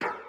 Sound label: breakbeat